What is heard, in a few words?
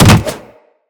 Jump Attack Default, Footsteps; Wall Run; Characters; Tenno